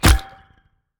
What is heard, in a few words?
fist hit; smite; god ganesha skin11